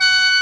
SHEN F 3

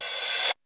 Reverse Crash, Drums; ZERO G - Datafile DISC 2

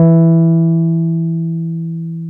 Wurly, Keyboards of The 60's and 70's

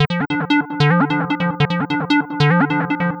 150 Dm, Melodic Loops; M8; Electronisounds

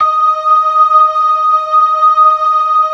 LO MAN V R, ORGAN LOWER MANUAL

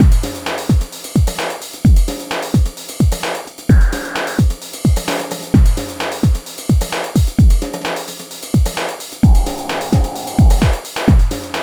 Beat, Drums, Eclectic Electronica

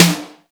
808 Snare Fat; Drums; Snares

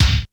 BASSDRUM, D110 DRUMS; Crypton - DrumBase5000

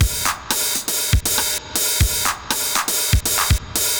Spring Verb Compressed TR 505 120BPM; musicradar; cyborg beats

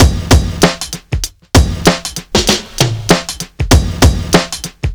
Drums, Cinematic Funk

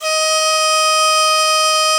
DIZI D#4; E-MU Producer Series Vol. 7 – Old World Instruments

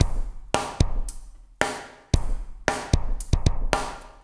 LQT DRMSWT, Liquid Grooves; Spectrasonics; 113 LIQUITEK

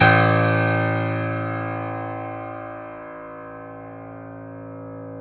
PNO, Grand Pno; Club-50 - Foundations Roland; YPno 1 M

